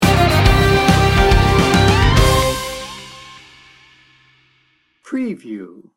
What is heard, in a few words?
majestic sports rock sting bumper